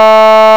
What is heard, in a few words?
horn, sirens; feniex storm pro